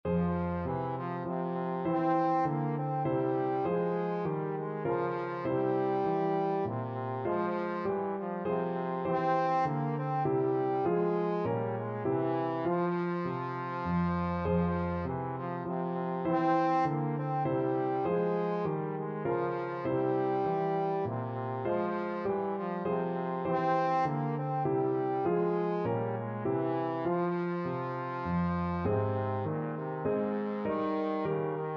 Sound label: trombone